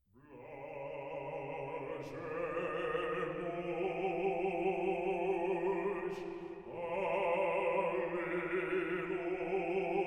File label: Slavanic Byzantine Choir, tape reel to reel; Mir Gospodu